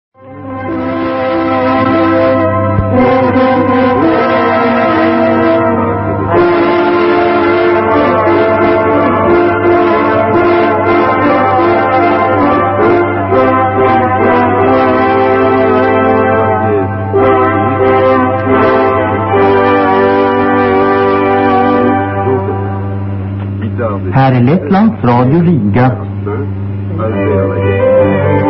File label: interval signal; radio riga 1991